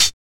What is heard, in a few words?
Closed Hats